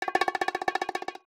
bongo, resynthesis; SDIF; ICMC2000; Loris

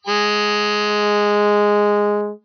sax honk to trump

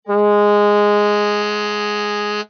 trump to sax honk

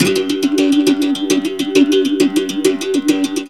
berimbao; SDIF; ICMC2000

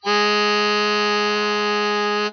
sax honk

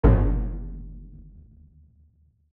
bassdrum, resynthesis; SDIF; ICMC2000; Loris

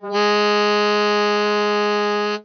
morph, timbral interpolation

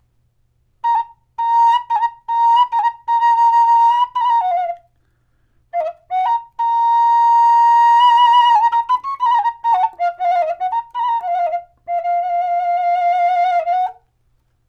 suling; icmc

